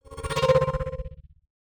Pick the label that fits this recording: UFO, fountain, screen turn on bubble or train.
UFO